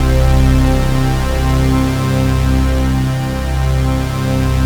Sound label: MKS BASS